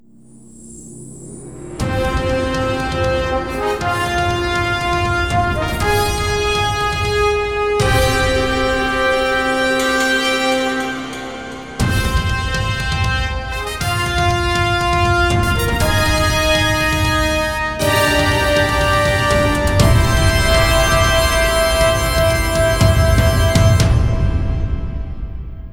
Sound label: WINNING FORCE front fanfare; Swap Fire; Wii U